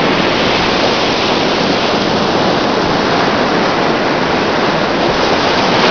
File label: storm